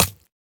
三叉戟穿刺声

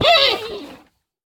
熊猫死亡声

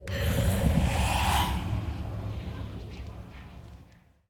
不祥试炼刷怪笼环境声; ambient

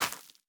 收获下界疣声

